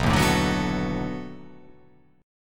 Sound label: B 7th Sharp 9th, down